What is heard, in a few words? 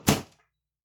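lid close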